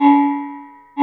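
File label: BONANG, Metalophones, Pitched Percussion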